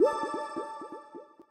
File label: start Spin; berry burst